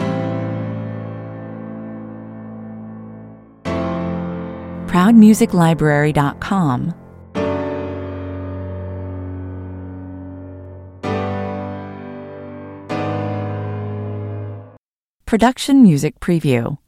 underscore version piano loop

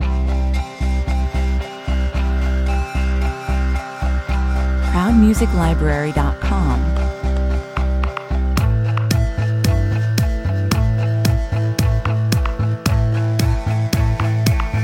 acoustic, 112 bpm

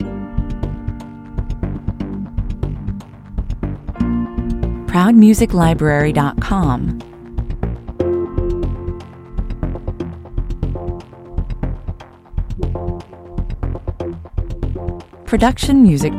on hold, 120bpm

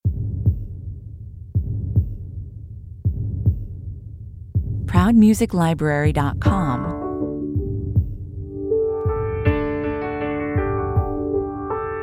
heart beat 80 bpm

